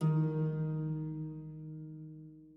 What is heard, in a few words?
harp